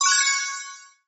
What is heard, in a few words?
sound coin; Wheel Race